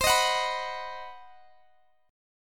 C Minor Major 7th Flat 5th, Guitar, Standard; strummed; x,x,22,20,19,19; capo=2

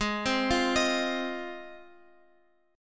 Ab 6th Ab, Guitar, Open F; x,x,8,7,8,10; Ab6 arpeggiated

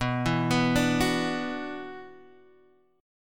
Gb 7th Suspended 4th Sharp 5th B

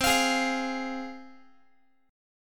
C Suspended 4th Sharp 5th, C, down, Guitar; Csus4#5 strummed; x,20,20,18,21,x

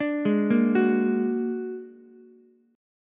Bb Augmented 7th Bb down Ukulele; Bb+7 arpeggiated; JGuitar

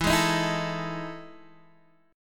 E Major 11th E down Guitar Standard; EM11 strummed; JGuitar

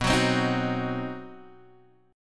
C Diminished B, Cdim/B chord; strummed; JGuitar

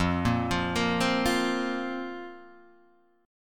F Suspended 4th, Guitar Standard, Fsus4 chord; arpeggiated; 1,1,3,3,1,1; JGuitar

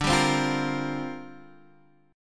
C 7th Suspended 2nd Suspended 4th D

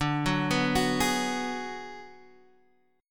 B Minor 6th D

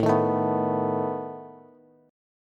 A Suspended 2nd Flat 5th A down Bass; Asus2b5 strummed

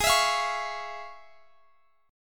F Minor Major 7th Sharp 5th, G sharp

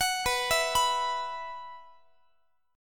B Suspended 4th, Guitar; arpeggiated; x,x,21,21,20,22

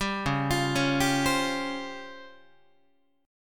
C sharp Major 7th Flat 5th Guitar; C#M7b5; arpeggiated

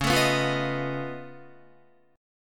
Db Augmented 9th Db down Guitar; strummed; JGuitar

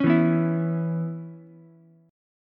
Db Minor 7th Db down Ukulele; strummed

